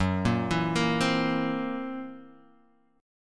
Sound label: Fsharp 7th Flat 5th down, chord; arpeggiated; JGuitar